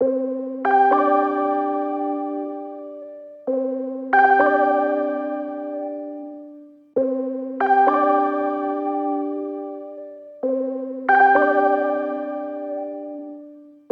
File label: 138 BPM C Min OB-X Lead; Loops; Loop Stems; Cymatics; Fantasy Synth Sample Pack; Afterlife